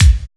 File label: BEST KICK, TECHNO; Drums In Your FACE!